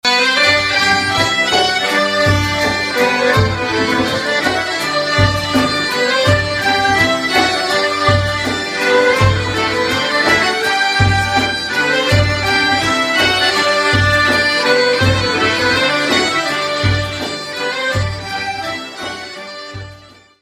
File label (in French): Scottish - Belgique